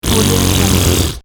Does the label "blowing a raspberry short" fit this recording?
Yes